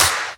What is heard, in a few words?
Claps; Vengeance Samples